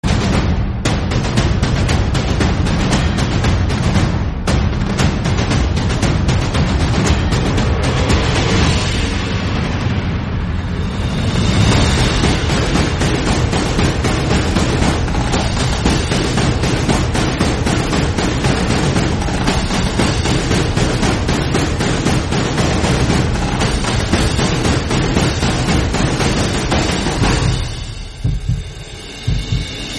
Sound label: The Drums Of Liberation Ringtone; One Piece